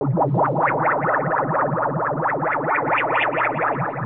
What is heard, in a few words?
attack; dexomon2